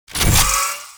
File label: Shock Rifle Equip